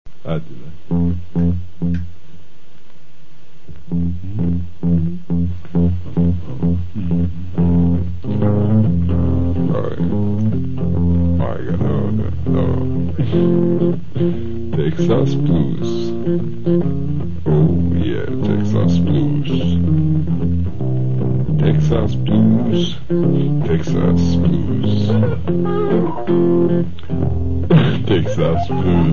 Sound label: blues; texas blues